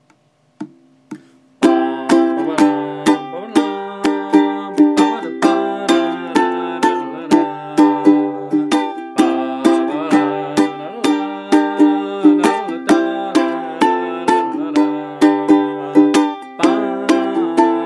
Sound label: ukulele